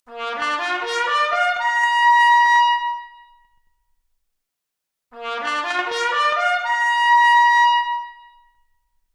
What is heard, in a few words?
Trumpet arp, Stereo output noised; 3bit